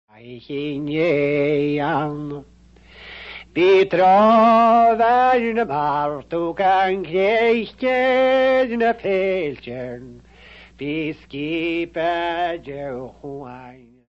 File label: Lullaby highland